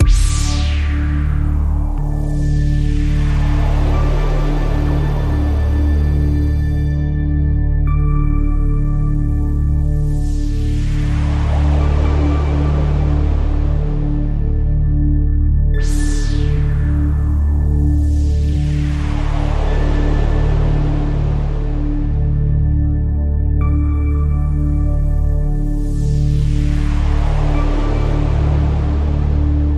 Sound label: Radio Toolkit Pad